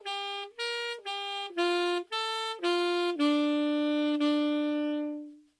solo slow second part, saxophone

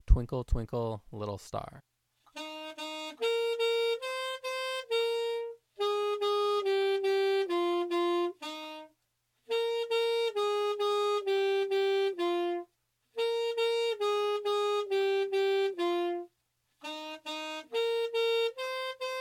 twinkle twinkle alto, saxophone

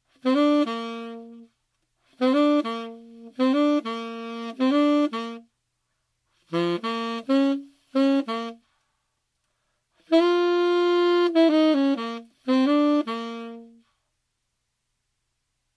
solo saxophone slow

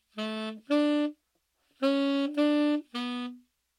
first piece slow saxophone

